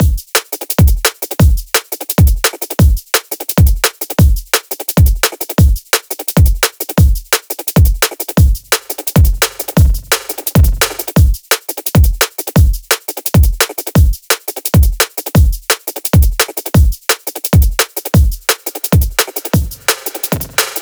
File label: Drum Beat 172bpm, drum bass; DNB BASS PAK; DECODEDDRUMBASS Zenhiser